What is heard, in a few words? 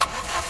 IGNITION, Car